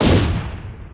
Poisonball Bomb; Enemy Wood; Flare; disney star smash; android

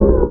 Toggle part

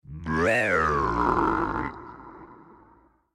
ChainGun; Hustlebonez ChainGun New

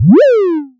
Bombdaruma Active Throw

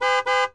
Honkhonk; Test Drive Off-Road 3

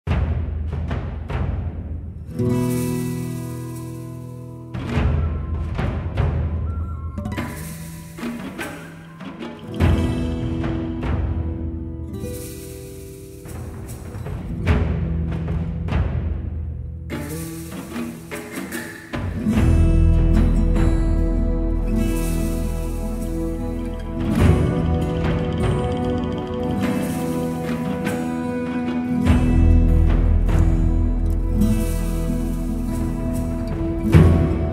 BGM Jungle

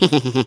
Laugh; Bath

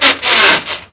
Gear Grind; Test Drive Off-Road 3

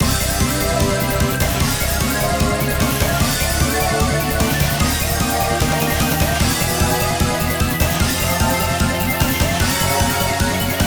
BGM Pinch

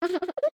Snowman Laugh; Lethal Company

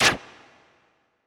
Prev Button

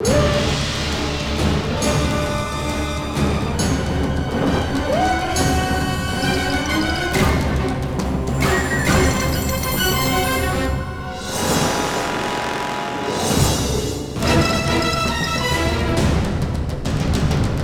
Combat

